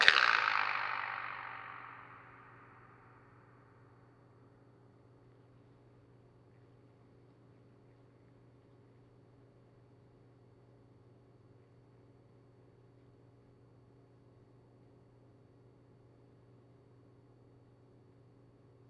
baker hall

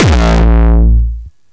bounce

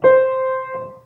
high C

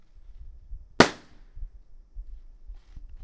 outside; tepper

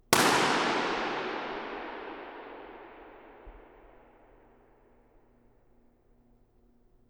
Racquetball Court

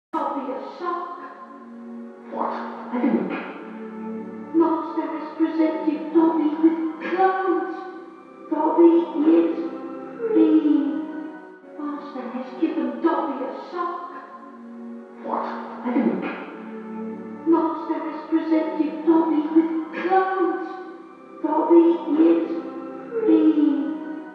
Dobby Porter Basement Womens Bathroom

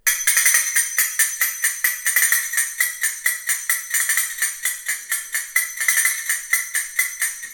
Castanets glass

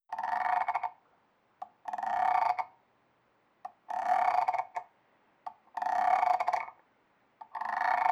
frog croak

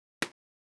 in Class

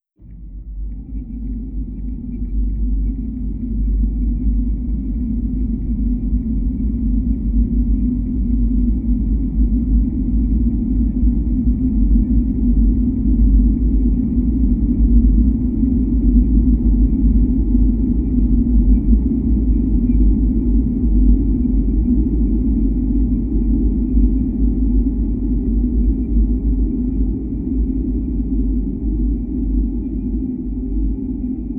Boiling Water

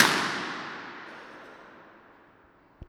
Entrance; Baker Hall

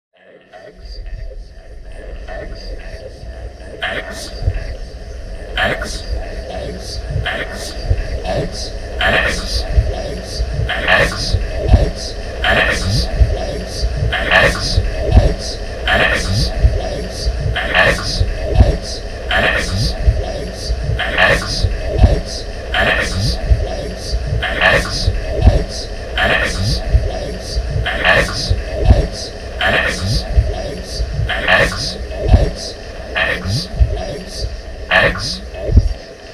Deep fried eggs

